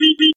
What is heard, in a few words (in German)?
Eisenbahn Meep